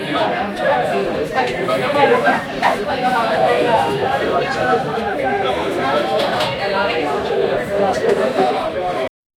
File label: faint sounds in a bar